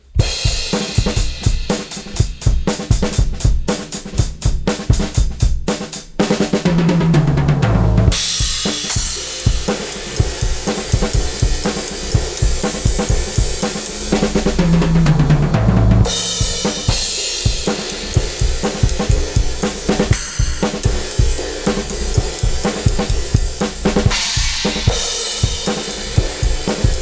Bus Processor THRESHOLD 25.0 dB MAKE UP GAIN 8.00 dB LOW CUT 500.0 Hz